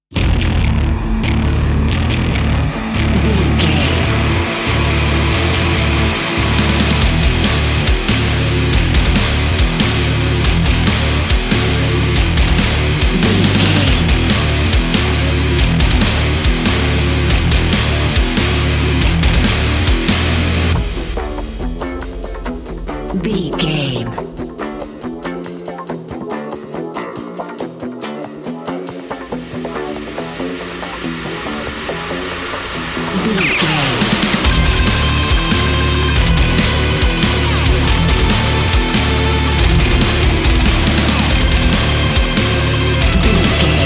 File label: metal